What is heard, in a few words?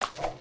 old man head spin; foley